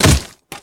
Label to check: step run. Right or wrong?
Right